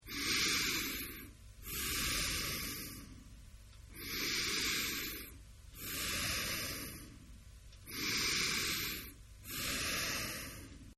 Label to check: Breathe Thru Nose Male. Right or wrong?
Right